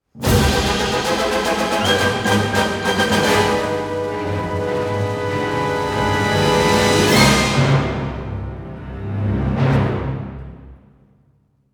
Battles Imperial Win; Hoth

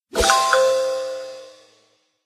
Powerup Awarded; Angry Birds

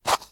Cookies Cup Pick

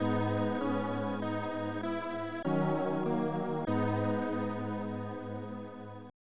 Lose Life; beauty and the beast 1994 snes

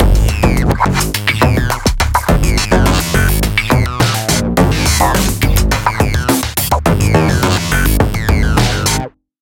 Fight Low Combo; Remember Me

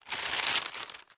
Pick Up Gift

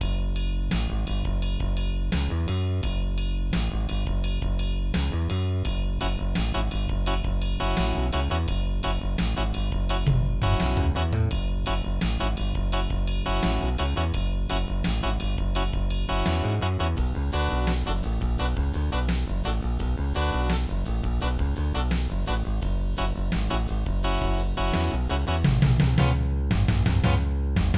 Shuffle, Arachno; Blake Stone Aliens of Gold; MS-DOS

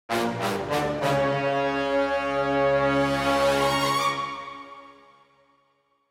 Stinger; Cinematic Music - Disaster Strikes Stinger

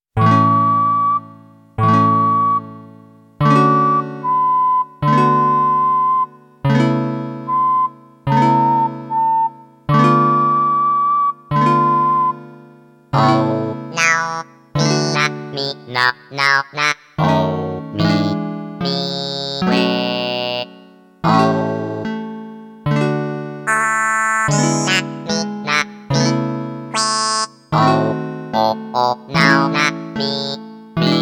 Hymn; animal forest totakeke music 2006

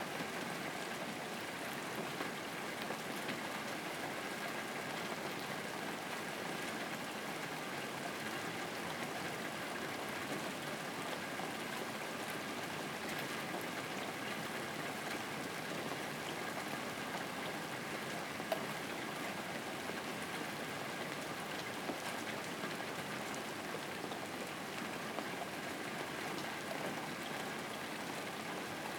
Soft Rain Loop

